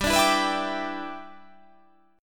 G 6th

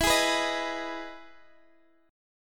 E Major 7th, Guitar, Open G; chord shape

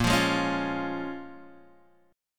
A sharp Minor 7th, Guitar, Drop D

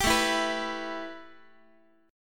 A 7th Suspended 2nd, A, down, Guitar; A7sus2 strummed

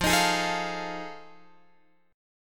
F 7th Sharp 9th, Guitar, Open F; F7#9 strummed

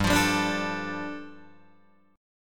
G 7th Suspended 2nd, Guitar DADGAD

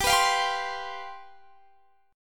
C Major 7th Suspended 4th, G, guitar chord

